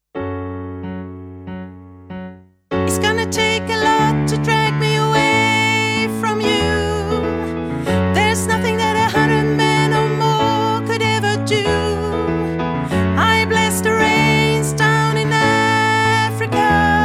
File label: SOPRAN